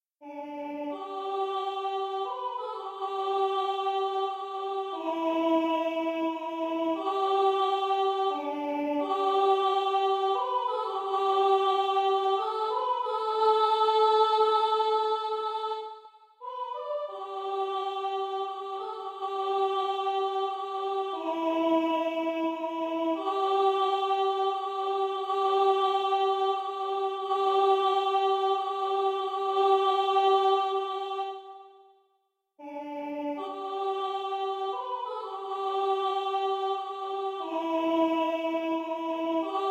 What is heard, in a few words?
amazing grace alto; Traditionnel; Choeur 3 voix SAT